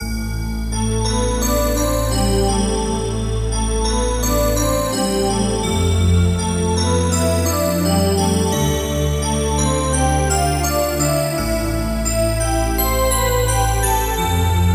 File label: glitter pad